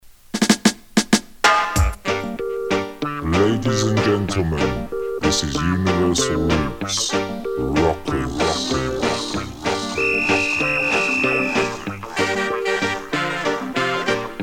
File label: horns